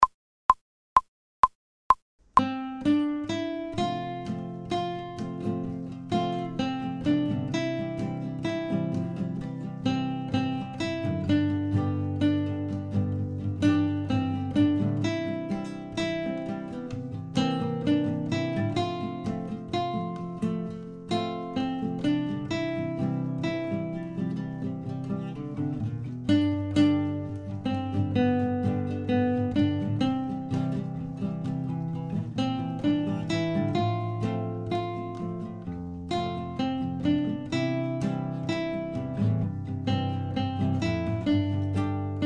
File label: fast, guitar, without metronome